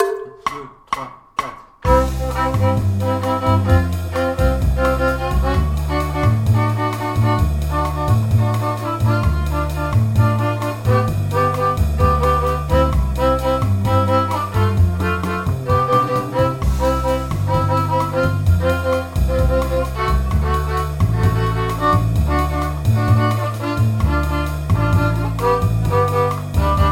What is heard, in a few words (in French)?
Bossa exercice rythme violon 132bpm